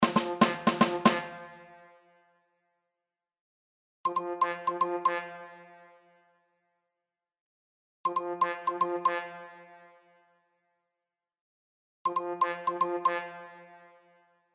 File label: tb funky